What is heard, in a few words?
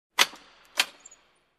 Door lock click sound